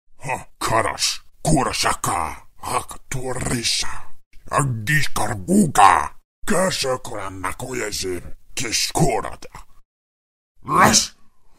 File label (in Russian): zvuki orkov